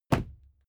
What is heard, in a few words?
fight impact sweetener punch shifted